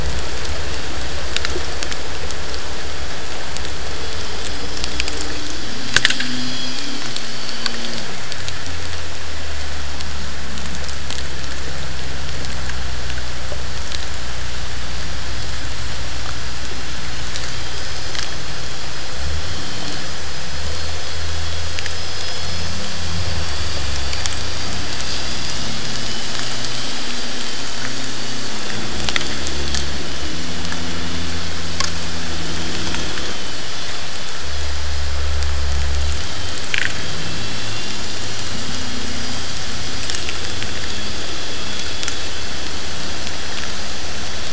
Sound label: TC transient, hydro events